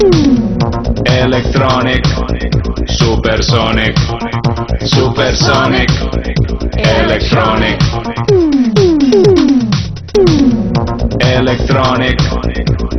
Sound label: ringtone